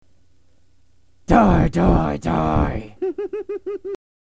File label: action